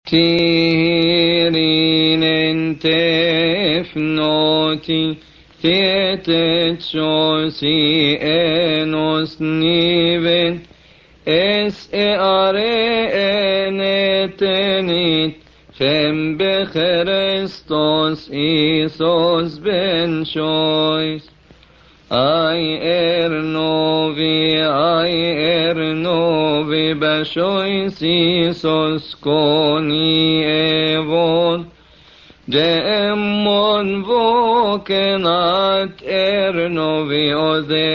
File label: Lent Days Gospel Response